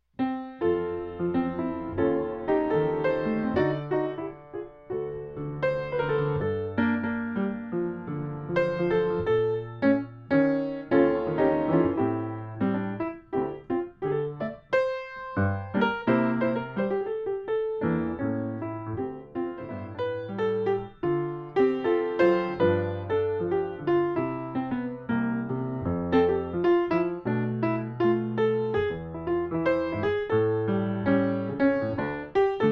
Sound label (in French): Jazz